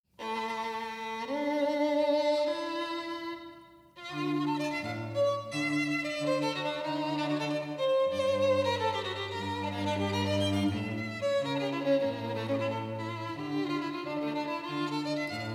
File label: Gypsy Song for Duet of Violin and Cello